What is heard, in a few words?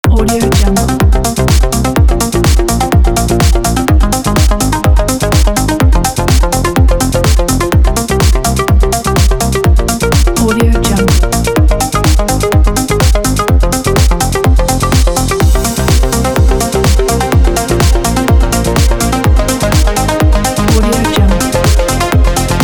travel trance kit